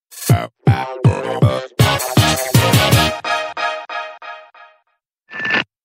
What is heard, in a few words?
mission clear music; splatoon